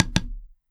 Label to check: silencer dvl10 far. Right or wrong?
Wrong